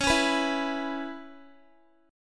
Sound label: C sharp Minor 7th, Guitar; strummed; C#m7